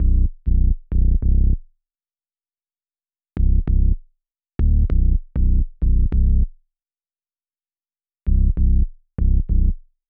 West Coast Melody Loop - 98 BPM E Min Bass; Melodies; Loop Stems; Cymatics; Oracle Sample Pack

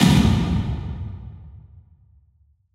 Epic Drum; Psytrance; FX

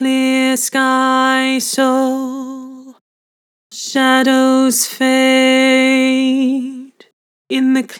Vibrato, Vocals; Clear sky soul; Harmor Vocals

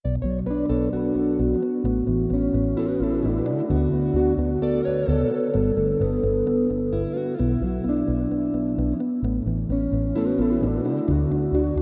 130 BPM D Maj, Guitar; Melodies

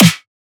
snare oneshot; GhostHack

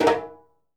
Djembe - A#; percussion; Cymatics - Infinity Beta Pack 2.0; Zeus Percussion Collection

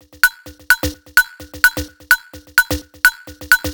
Perc Loop, 128 BPM; Drums; Loops; Top; House

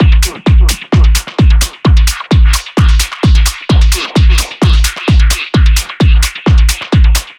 Drum Loop 130, Techno; Ghosthack; Free Techno Sounds 2024

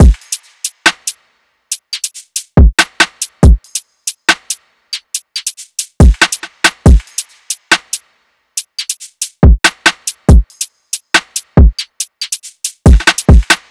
Drum Loop - 140 BPM

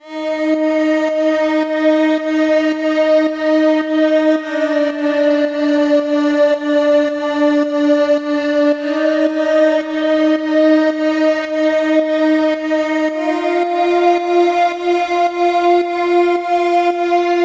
Pad G# 110Bpm, House; Ghosthack Advent Calendar; House Kit; House Construction Kit

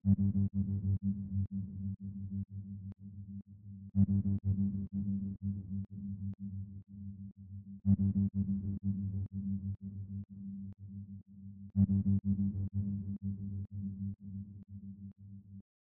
123 BPM G Min Drone; Melody; Loop Stems; God Fearing

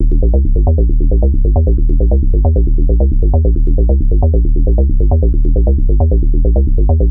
Bass Loop D# 135, Techno; Ghosthack; AC2024 - Day 1 - Techno Sounds 2025

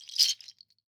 Ekpiri Belt Shaker; World Percussion; Cymatics; Emperor World Percussion

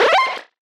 Retro FX; Cymatics; Video Game Sample Pack Vol 1

